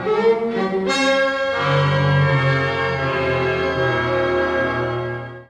Puzzle Jingle Creeping Up

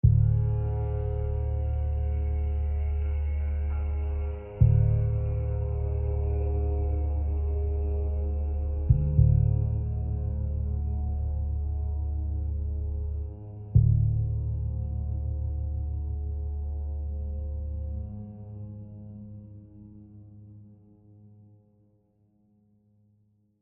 Tension Low; AB Tension 4 Low Block 3

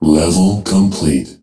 Level Complete; bejeweled 2 deluxe

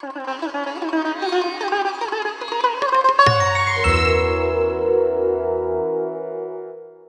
Bari Sax

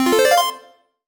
collect item chime

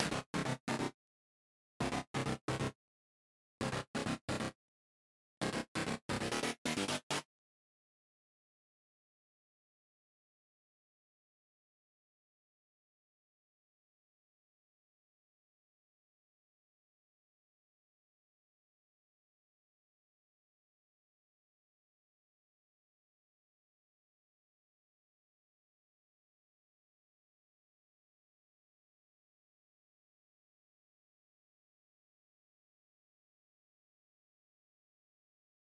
Breakout Doom Mix Stem Layer; Breakout Recharged

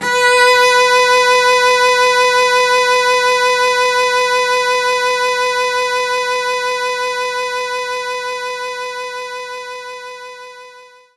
Cello B3

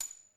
9mm Hit; Red Hot Vengeance

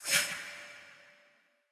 Souls In A Can Hearts

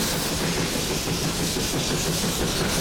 Steam Engine Loop; jasem just another shooter with electronic music windows 2017

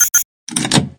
Open key; Zenonzard

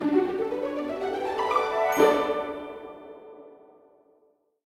Game Complete; Super Jario World; Android game music

